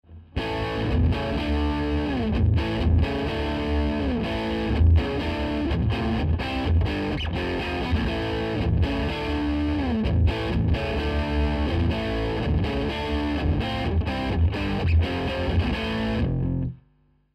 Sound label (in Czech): Kytara Drive 2 Voodo VR 1 Pasive